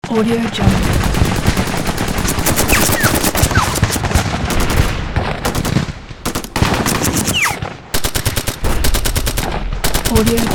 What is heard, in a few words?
battle field assault; sound ideas